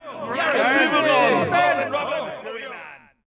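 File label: men murmur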